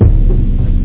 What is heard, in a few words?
mi drum